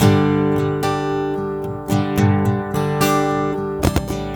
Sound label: unstrung, drums; BRADLEY FISH; Sonic Foundry (Sony Creative Software); Vital Drums